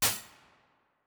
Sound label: Canned, Medium Rooms; Convolution; IR Samples; NI Kontakt 5